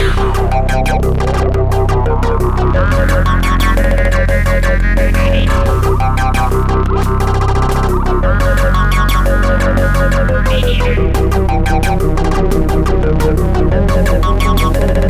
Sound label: Asteroids Inside Medium; rive wreck hack die retry; ps4